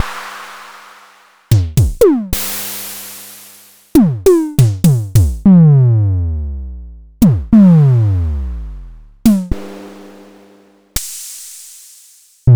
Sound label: MPC DSM 20; m8